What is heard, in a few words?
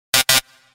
Wrong Buzzer